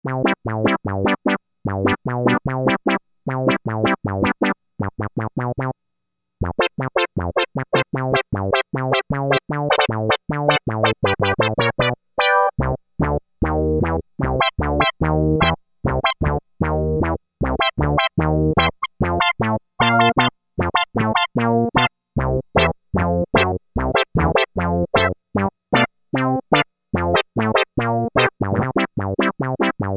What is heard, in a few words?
Wah Brass, Roland Juno-60; Factory presets